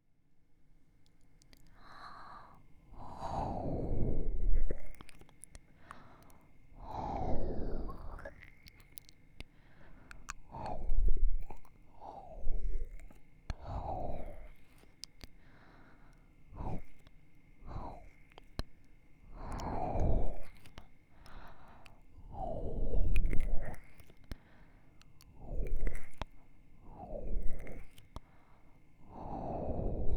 はむはむ（左）

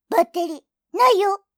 バッテリ切れアラーム

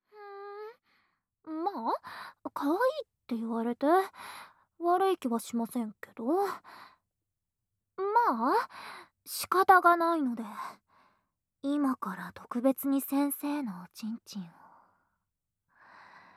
フェラチオパート; 03童貞の癖にレイヤーにフェラしてもらえるとか先生は幸運ですね♥フェラチオパート

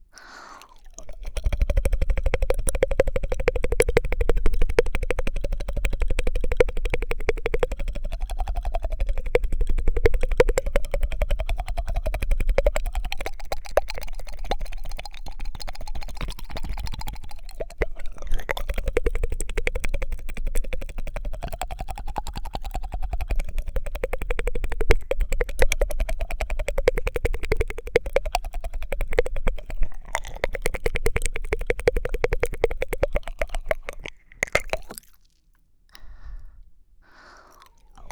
高速貫通耳舐めコース

